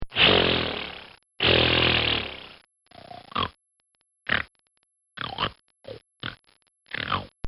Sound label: Gorilla Snorts; Animals; Bobby Cole